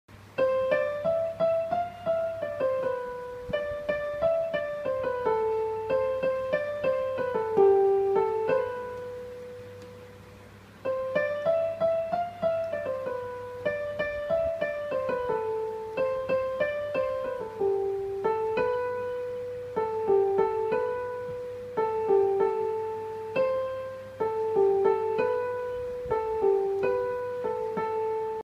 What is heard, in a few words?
piano; pandavulu pandavulu